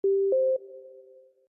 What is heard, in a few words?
new Conversation